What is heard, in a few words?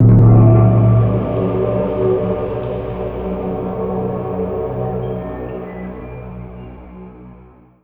burnt villiage sting